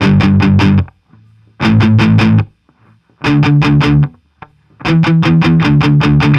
150 Pop Guitar 8th G maj, Electric; Pop Rock; Electric 100-160 bpm; Pro Tools Essential Loops; Digidesign

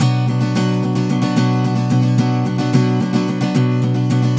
109 Pop Ac-Guitar Strum Bbmin; Pop Rock; Acoustic Guitar; Pro Tools Essential Loops; Digidesign

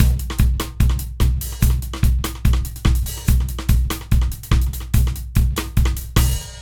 145 Pop Drums Sidestick; Very Fast Tempo; Pop Rock; Pro Tools Essential Loops; Digidesign

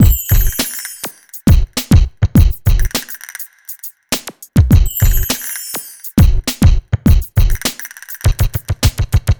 Hip Hop Beat, Drums; Digidesign; Pro Tools; Pro Tools Essential Loops

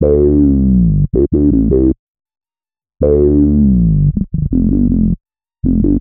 HipHop Synthbass Dmaj; Digidesign; Pro Tools Essential Loops

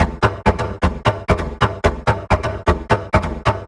130 Dance Perc Fx; Percussion; Pro Tools Essential Loops; Digidesign

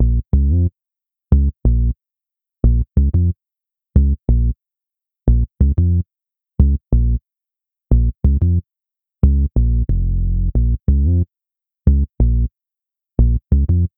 E-Coast HipHop Synthbass Bbmin; Digidesign; Pro Tools Essential Loops

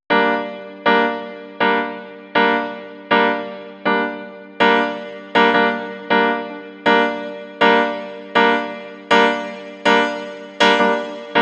080 Roots Reg Piano G; Digidesign; Pro Tools; Pro Tools Essential Demos; Essential Loop Demos; Demo Session - World

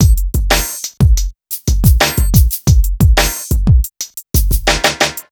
Hip Hop Beat, Drums

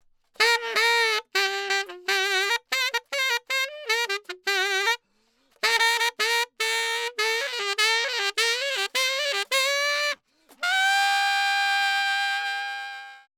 Trad Jazz Sax Solo, Gmaj; Brass; Digidesign; Pro Tools; Pro Tools Essential Loops

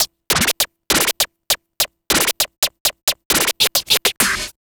Vinyl Scratch Snare

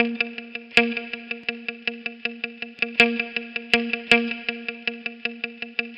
080 Roots Reg Guitar G; Digidesign; Pro Tools; Pro Tools Essential Demos; Essential Loop Demos; Demo Session - World

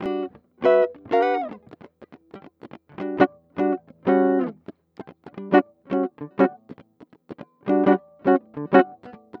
102 NuJazz Rhythm Guitar Emaj, Jazz Guitar Loops; Pro Tools Essential Loops; Digidesign

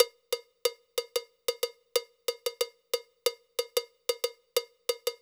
092 Dance Cowbell; Dance DJ; Percussion; BellCowbellAgogo; Pro Tools Essential Loops; Digidesign

